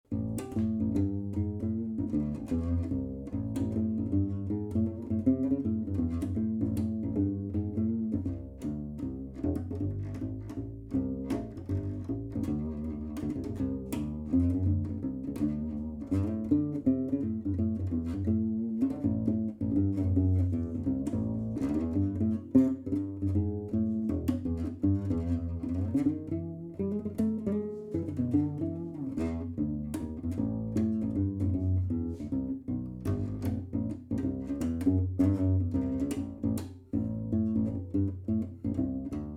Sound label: Canna Bass Evo Mic only; cannabass evo birdseye